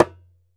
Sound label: ASHIKO, African Perc